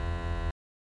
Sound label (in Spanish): zumbido electrico